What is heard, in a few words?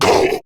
Pain, Grineer Beastmaster, Barks, Battle